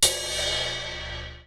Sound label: big cymbol